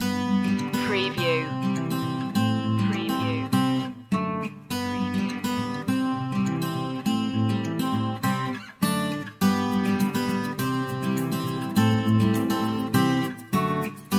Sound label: our happy place loop